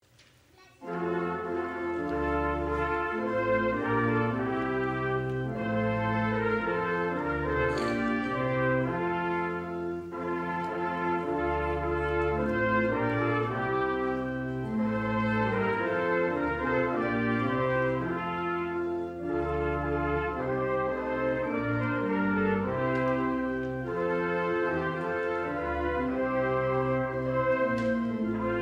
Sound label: trumpets; Alleluia; Christ the Lord Is Risen Today